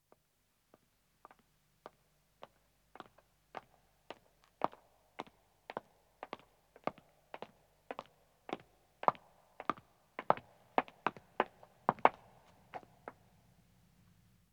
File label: Stone Walking, 1 Man 1 Woman, footsteps; BBC Sound Effects Library